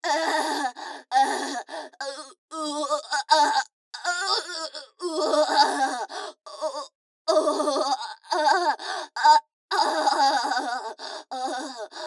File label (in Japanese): 喘ぎ声